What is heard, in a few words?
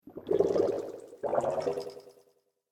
Water Space Bubbles, sci fi